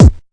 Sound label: nasty hard kick; Kicks; single hits